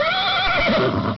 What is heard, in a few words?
animal horse whinny